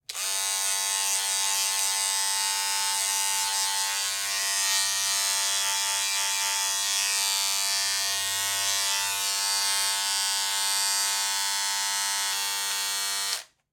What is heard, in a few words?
Razor, Electric, On, Run, Shake, Gentle, Off; Bathroom; Airborne Sound